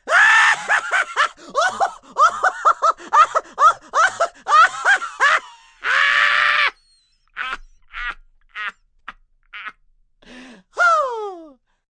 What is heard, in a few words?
Laugh Hysterical, Laugh, male, hysterical, side-splitting, being tickled (human voice)